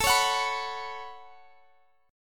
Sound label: A 6th Add 9th, Guitar Open F, chord; A6add9 strummed; x,x,21,20,21,18